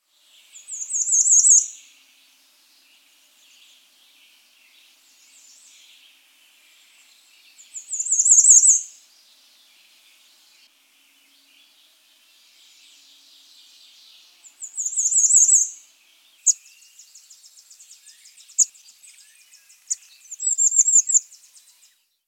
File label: Bay-breasted Warbler, Birds